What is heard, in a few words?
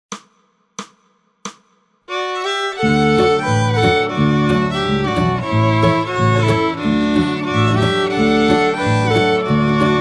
Wildwood Flower C 180 Fiddle 1 and 2